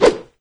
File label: miss, imp; doom3